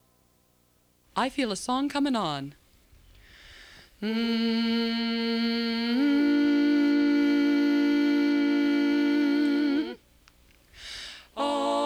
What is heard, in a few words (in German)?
Bariton; region17online